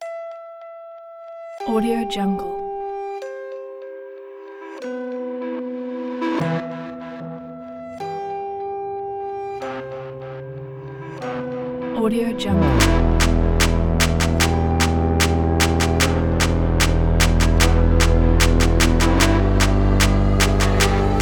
dark hip hop trap

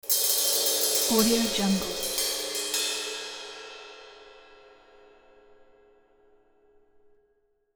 cymbal trill spooky

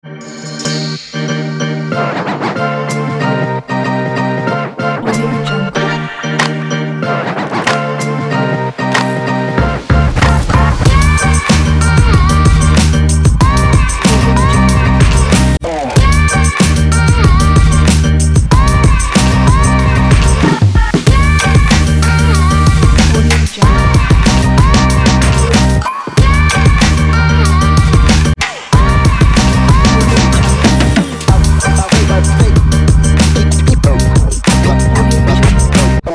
hip hop

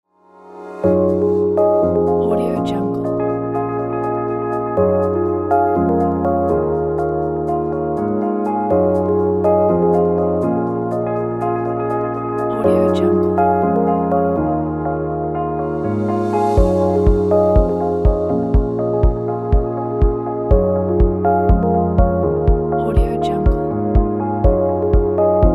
ambient inspiring

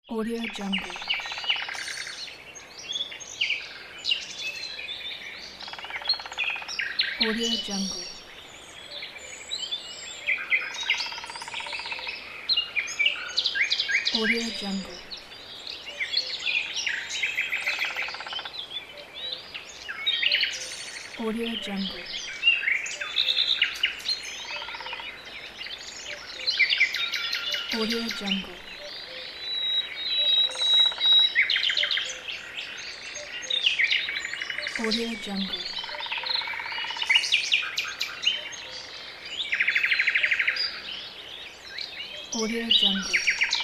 nature sounds

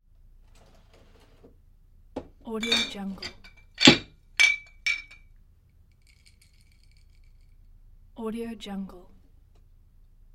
barman organize bottles and glasses

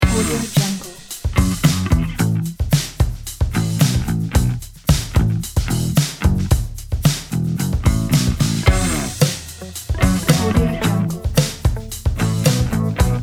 funk bass neo disco groove

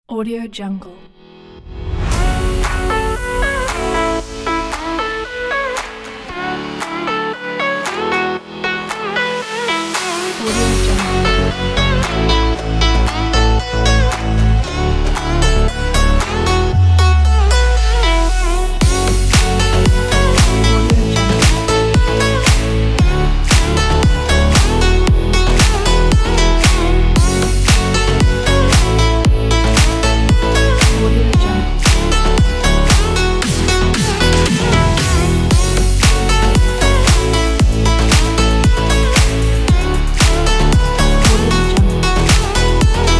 fashion pop

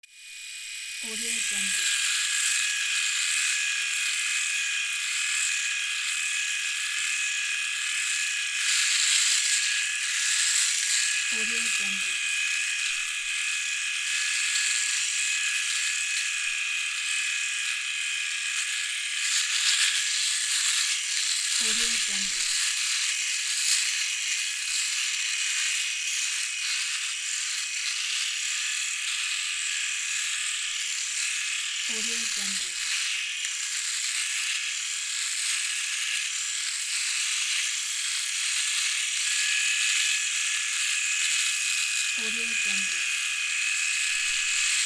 electric razor

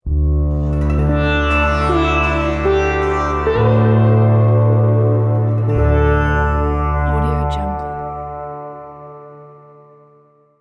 indian sitar logo

